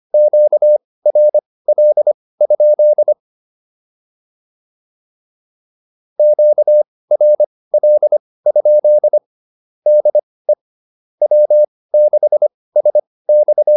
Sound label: QSO, intermediate practice files